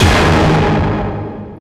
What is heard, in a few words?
STAB